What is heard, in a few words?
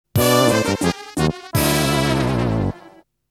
Miss